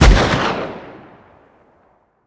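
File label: weapon pistol mag fire player atmo ext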